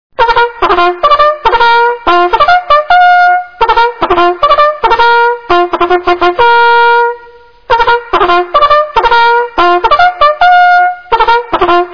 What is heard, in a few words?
drill call, bugle